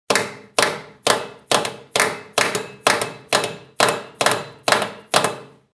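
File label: plastic sound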